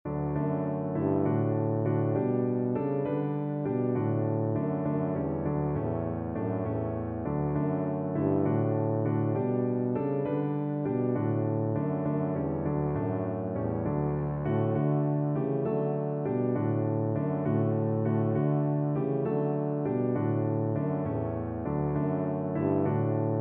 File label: sweet hour of prayer, tuba